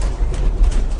downtown elevator; ambient; HEDZReloaded

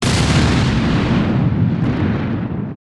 missile explosion; abilities; HEDZReloaded

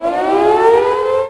panic attack; HEDZReloaded

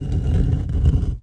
downtown underground elevator; HEDZReloaded; ambient

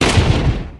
throw explosion; HEDZReloaded; abilities

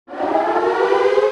panic attack; HEDZReloaded

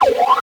backpack open; ui; HEDZReloaded

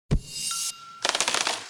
cash collect; HEDZReloaded; effects

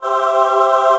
heal; abilities; HEDZReloaded

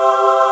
heal; HEDZReloaded; abilities

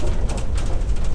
downtown elevator; ambient; HEDZReloaded

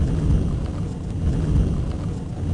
downtown underground elevator; ambient; HEDZReloaded

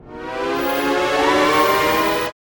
beam in out; HEDZReloaded; effects

